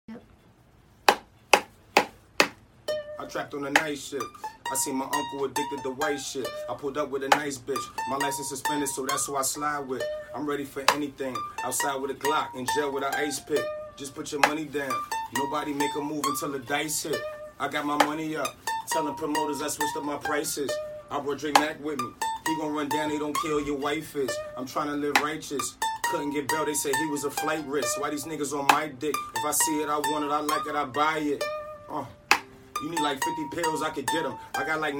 Acoustic

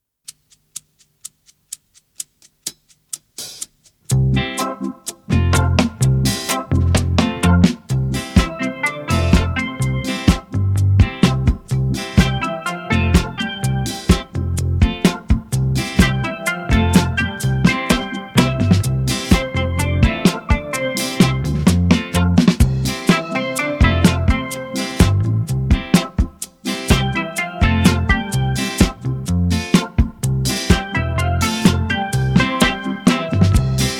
Reggae

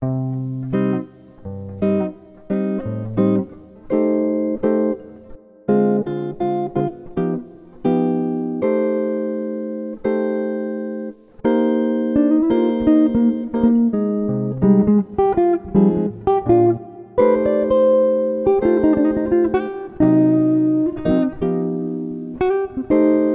Cocktail Bossanova

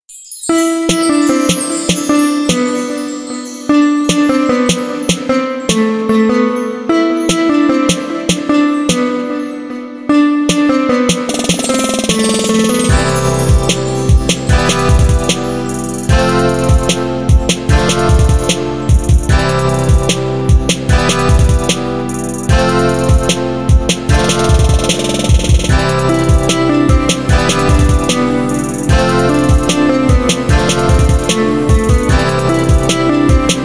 GUITAR

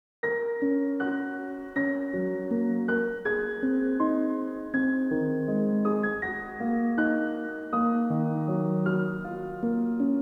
Relaxing Classical Piano Music Instrumental Piano

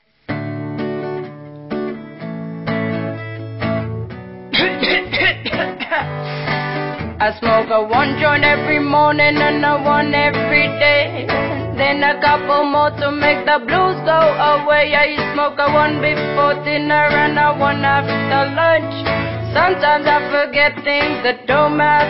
Reggae Version

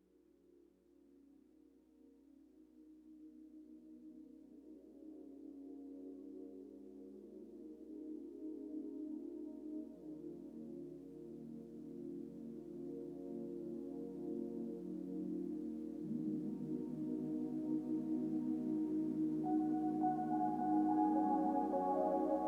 Natural White Noise

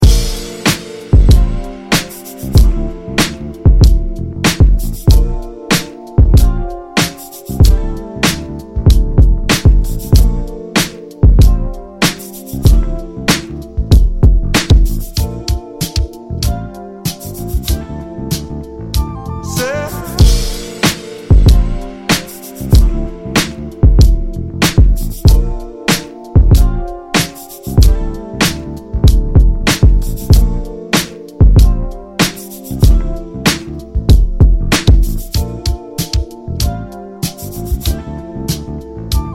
Windchime; grimelabinc

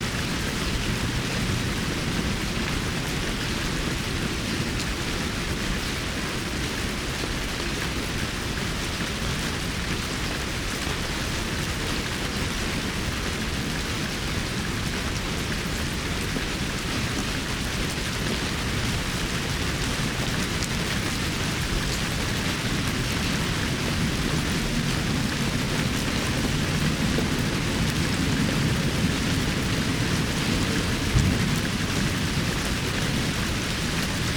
Gushing Rains, Soothing Music

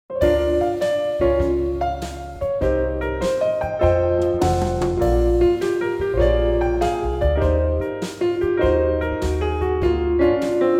Relaxing Jazz Trio

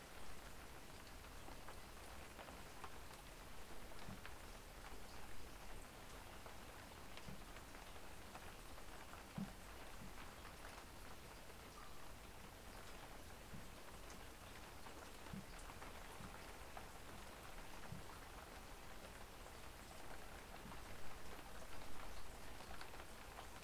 Calm Rain on a Wood Barn; Dr. Henrys ASMR

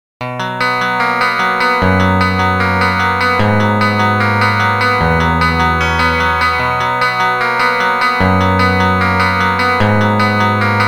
Guitar

